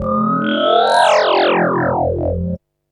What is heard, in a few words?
FX, Drum & Bass; Sonic Foundry (Sony Creative Software) - Fluid Dynamics Drum & Bass